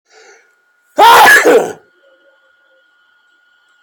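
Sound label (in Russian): аллергические риниты чихание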